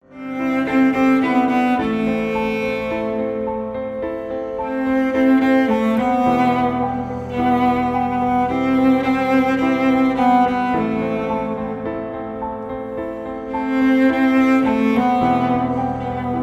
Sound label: (For cello and piano)